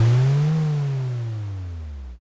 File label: dinosaur roar